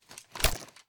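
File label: assault rifle inspect, weapons; gmod; viper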